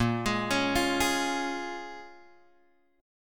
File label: Bb Minor 7th Bb, Guitar, Open G